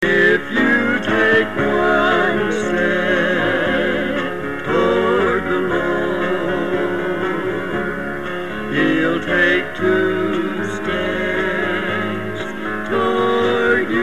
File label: theme; WPLA